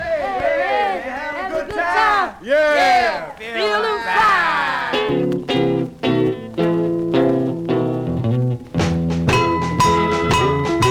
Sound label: Bells of Auld Lang Syne